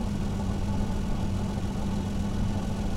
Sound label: leo2a5 engine rpm 00 load, engines tanks; War Thunder